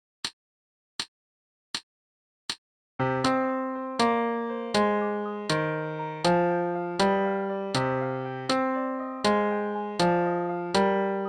jig, full tempo with solo

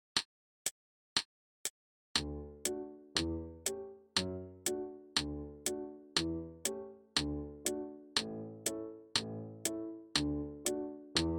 gavotte, no repeats medium tempo no solo

